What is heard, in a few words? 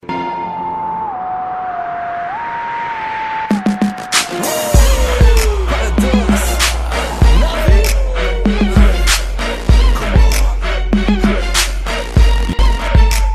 Arabic Style Mix